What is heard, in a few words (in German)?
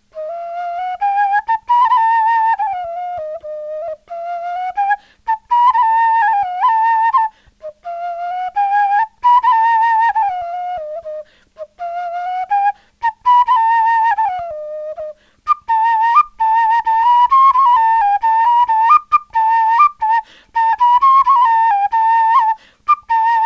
melodie, knochenfloeten